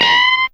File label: Wail Gtr C5, Fairlight CMI IIe; Guitars